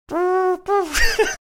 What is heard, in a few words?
trying play taps mouth trumpet